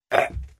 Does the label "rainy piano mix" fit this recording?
No